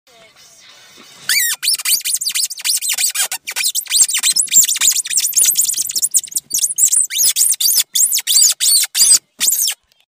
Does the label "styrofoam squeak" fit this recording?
Yes